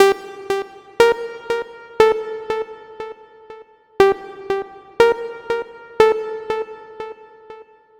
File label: Trance Synth